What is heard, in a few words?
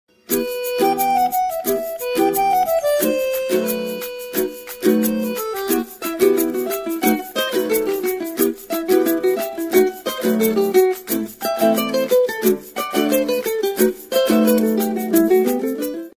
instrumental ringtone; dil bechara